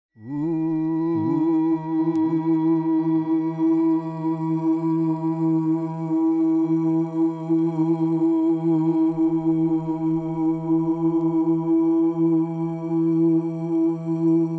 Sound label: Voice Ooo in D; Sacral Chakra